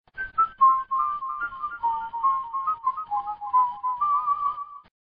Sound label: whistle ringtone; classical; nokia